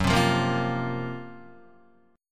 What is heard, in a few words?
Gb Major 7th Flat 5th Guitar; GbM7b5 strummed; JGuitar